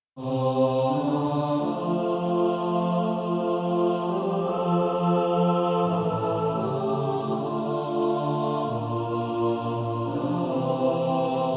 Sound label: mixed